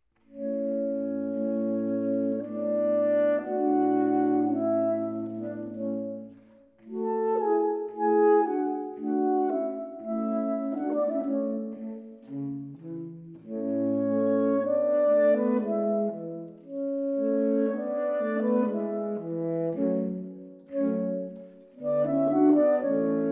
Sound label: String Quartet No. 21 in D Major for Saxophone Quartet Andante